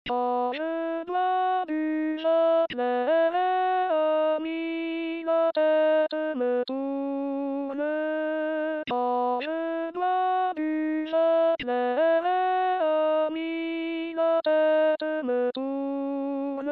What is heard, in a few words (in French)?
alto; l harmonieux vigneron